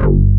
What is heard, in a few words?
NIGHT BASS, Synthsonic; Pro-Rec - Synthsonic; BASSES